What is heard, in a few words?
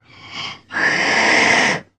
Ocelot Hiss Medium